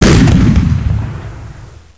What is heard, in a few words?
tank fire